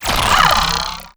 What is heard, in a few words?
bolt huge zap